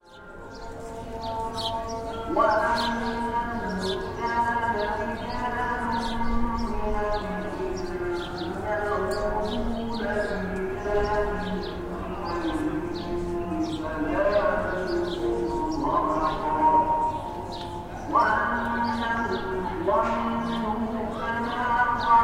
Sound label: Call to prayer Jerusalem 3D binaural